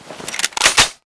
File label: draw